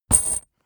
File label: metal chain impact